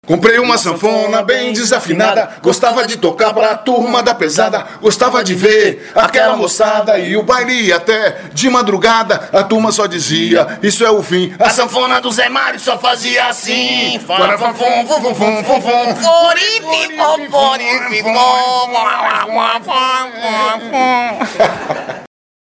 vinheta cantada sanfona

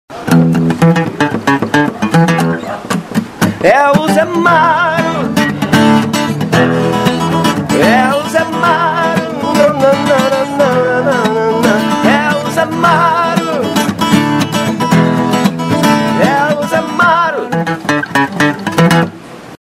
Vinheta Cantada

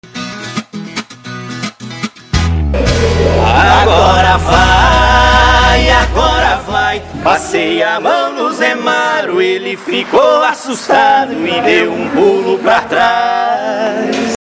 vinheta cantada

